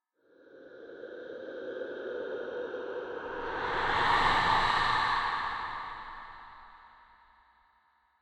Eerie Distant; silent hill homecoming 2008 gamerip pc ps3 x360

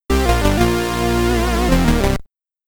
Game Over (SID Stereo); demon blue commodore 64

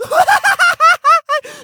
Mad Laughing

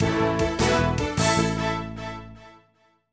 Victory; Poptropica Adventures; nds

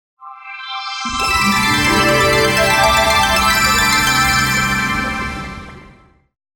Full Recovered!; denpa men

